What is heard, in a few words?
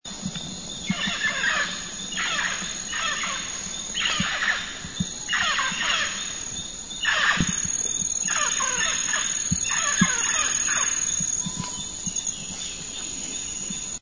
mealy parrot